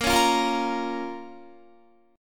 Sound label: Bb Minor, Guitar; strummed; 18,16,15,15,17,17; 4E,4A,5D,5G,5C,6F